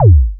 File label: Bassdrum, Roland System-100; Drum Samples